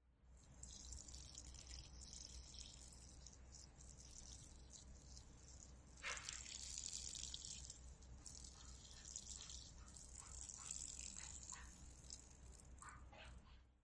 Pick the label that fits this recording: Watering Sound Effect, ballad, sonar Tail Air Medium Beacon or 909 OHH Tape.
Watering Sound Effect